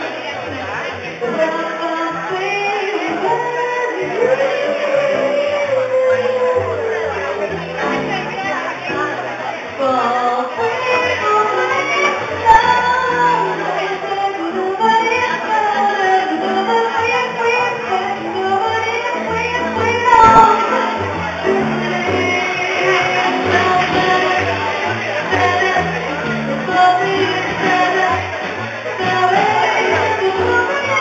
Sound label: a bit of jazz in Guayaquil